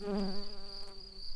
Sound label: Pascuorum workers, bumblebees